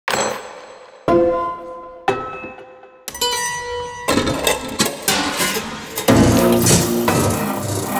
Prepared Piano Loop

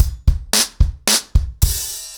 110BPM, ACOUSTIC DRUMS; The Roots Drumloops

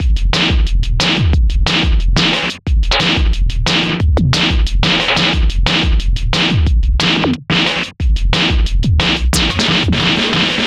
gulp 090bpm; Zero-G; Downbeat Dance Grooves

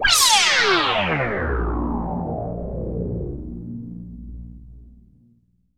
PROPHE FX, SYNTH FX; AKAI S6000 CD-ROM - Volume 6; Snth FX

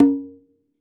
Conga Hit, Latin Percussion, One Shots; Sonic Foundry (Sony Creative Software); Joe Vitale Latin Percussion

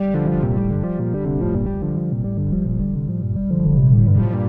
Arp, Guitartronics; Sonic Foundry (Sony Creative Software); Ma Ja Le Ethereal Textures

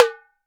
TAMBORI RIM, BRAZIL

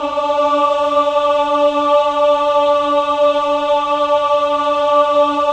MEN AH, Choir of Ahs; Roland - LA Composer vol. 1

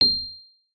C8, RHODES; Xsample VOL-1

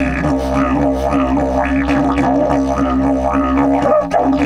108, Didgeridoo

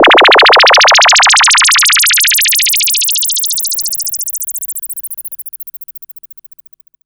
filter rise; One Shot FX; Sample Magic; Transmission-X

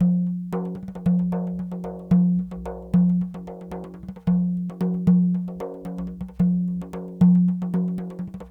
Sm Frame, World Percussion

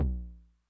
Low Tune Long Decay, 909 LOW TOM; classic machines; LT - LOW TOM

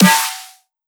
pan snare

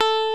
LES PAUL

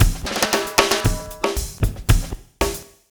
Fonkee Drm Fill 115, Lounge Drumz

